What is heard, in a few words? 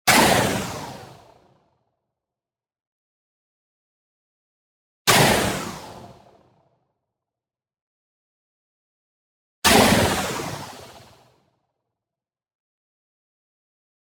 IMPACT WHITE NOISE Descending Shift; boom library; Anime Essentials